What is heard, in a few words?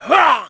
Slap; Ganondorf; Zelda